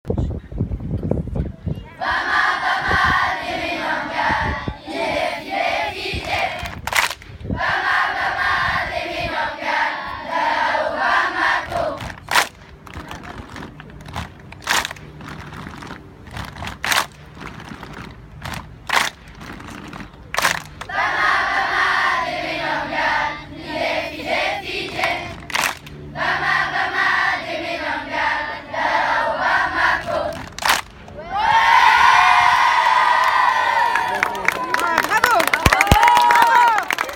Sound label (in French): chant chorale